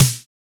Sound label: soft hit clap